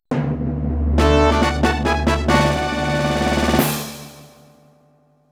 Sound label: Board Map Fanfare